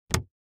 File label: Household Bin Close Lid Lock Plastic